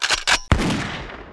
안티매터리얼블래스트, effect

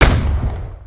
hit 그레네이드런쳐

